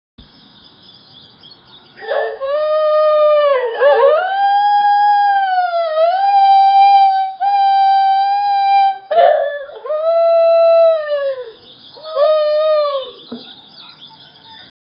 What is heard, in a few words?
whale singing to his fami; communication